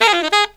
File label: SAX, Killer Brass Riffs